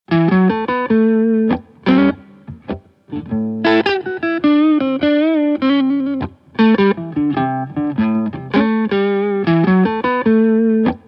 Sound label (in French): deep gain; deep gain à 9h puis 14h